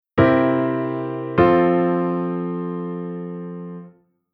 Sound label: Chord Sec Dominant V7 of IV